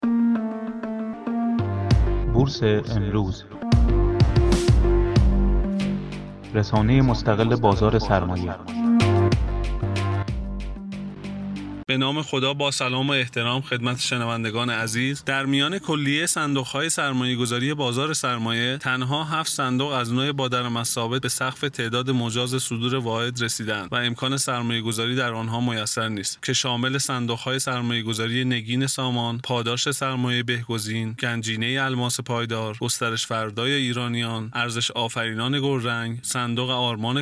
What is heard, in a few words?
ahhhahahahhahah